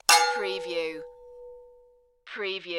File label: Metal Clang